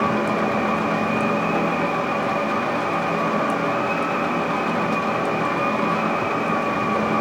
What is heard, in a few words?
Air Vent Loop; Ambience; Props; Warframe; Nineteen Ninety Nine